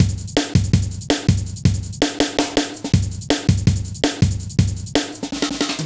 Heavy Live B, Drum Loops, Jungle; Zero-G Jungle Warfare 1, 2 & 3

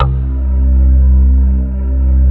JV PADS; Pro-Rec - Synthsonic

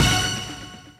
D2 STAB; Zero G; Man Machine

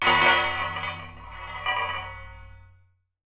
Hi hat, contact mic, Marbles; musicradar; contact mic madness; Raw

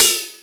HAT; E-MU Formula 4000 Series Vol. 1; Hip Hop Nation

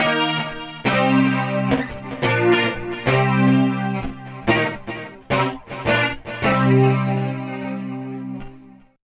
GTR; East West - Phat & Phunky CD2; 90's Sample CDs

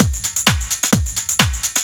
drum 130 groove; Techno Trance Essentials

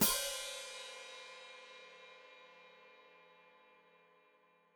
Hat Closed Open, Hi-Hat

